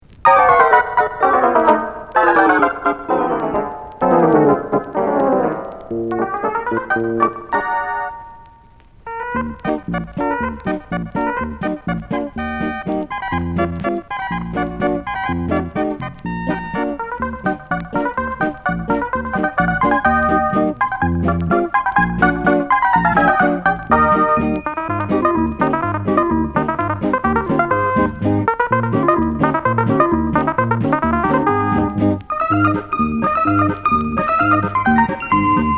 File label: orchestra